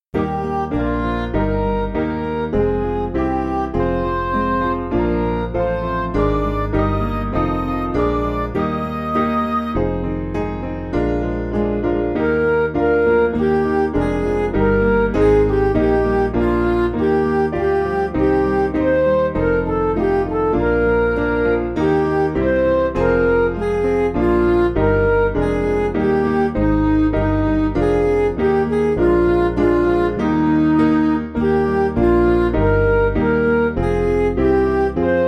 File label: In Thee Lord We Rejoice, Midi; Pant Gwyn